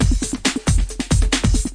136 bpm super sonic, drum loops and breaks

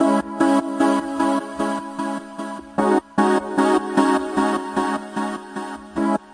151 beautiful stutter synth; SYNTH AND ELECTRONIC LOOPS

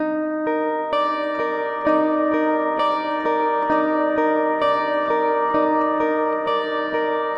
130 beautiful mellow guitar arpeggio; GUITAR LOOPS

